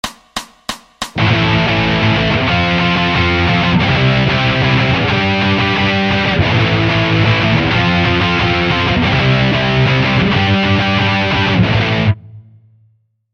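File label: Rhythm Guitar Punk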